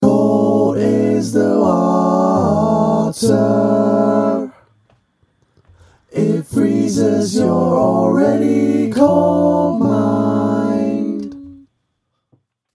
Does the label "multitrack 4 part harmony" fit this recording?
Yes